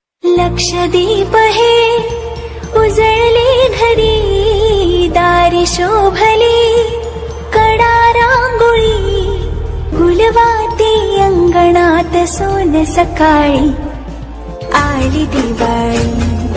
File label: diwali ringtone festival song ringtone; happy diwali; india big festival